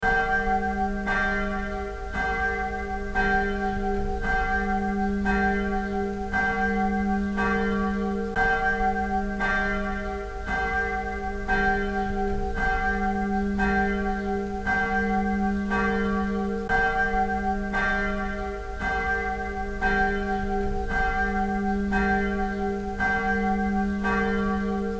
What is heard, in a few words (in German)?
Turmglocken